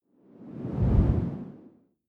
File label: whoosh simple long low; swooshes-swishes